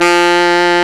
SAX F2; AKAI S-Series CD-ROM Sound Library VOL-8

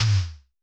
tom, Roland MC-909

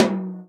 tom, Real Drum Kit, jungle kit

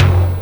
Dance Drums; Best Service ProSamples vol.15; AKAI

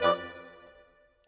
Bassoon, Clarinet Oboe; Sonic Foundry (Sony Creative Software); Orchestral VOL-2; Modern

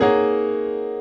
CHORD L, Drum & Bass 140 BPM; Ueberschall; Drum & Bass Flash Trackz

